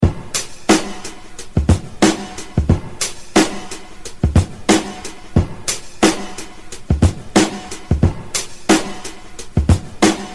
Drum Break - Loop

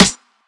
Snares

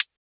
HiHat, Closed Hats

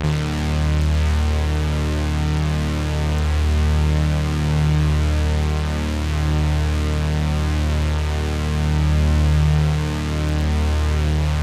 Synth

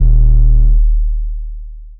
808 clean D; 808s

